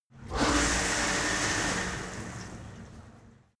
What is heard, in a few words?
cleaning machine start running stop short